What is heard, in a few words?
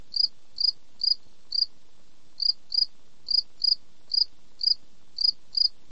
Gryllus veletis; Arthropoda